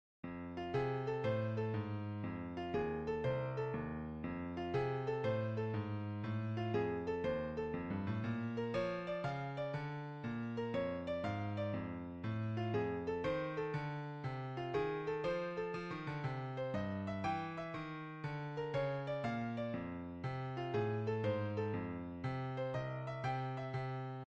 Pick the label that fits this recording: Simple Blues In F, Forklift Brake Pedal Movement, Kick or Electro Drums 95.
Simple Blues In F